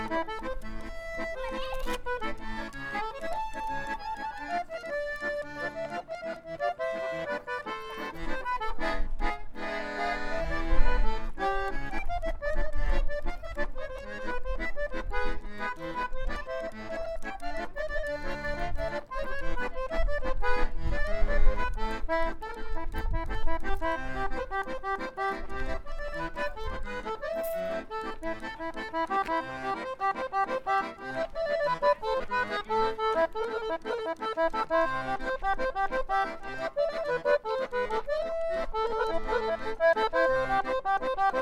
Vienna Belvedere Palace Accordion, street musicians; Accordion Players